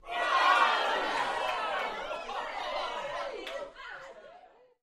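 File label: Crowd 15 Interior Rowdy; small int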